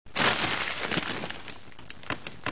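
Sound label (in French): texture supermarché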